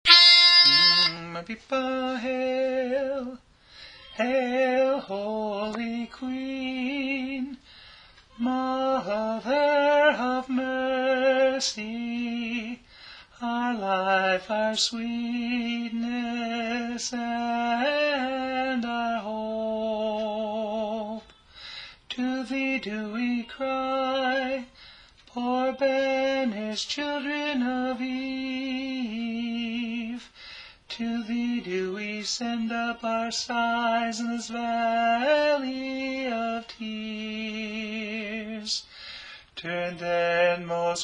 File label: Hail, Holy Queen (Tenor)